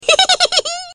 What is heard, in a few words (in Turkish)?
hi hi hi gülme sesi